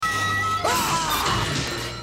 yell; Robotnik

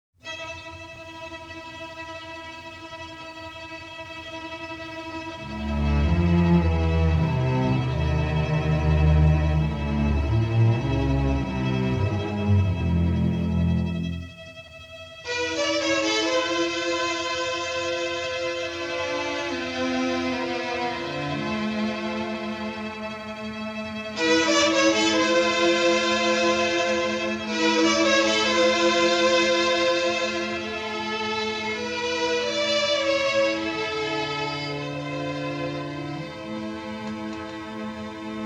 cue for string orchestra

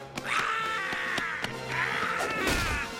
yell charge; Scorpion

